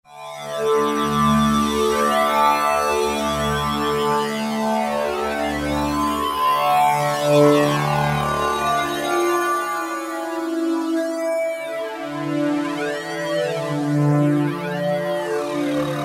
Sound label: Slo Sync Sweep, Roland V-Synth; Factory Patches